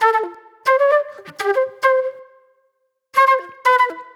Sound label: Flute, Disco